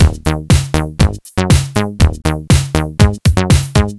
120 bpm analogue full, combi loops, nu dance electro; Zero-G; Soundclash Nu Dance Electro